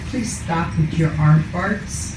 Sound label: arm farts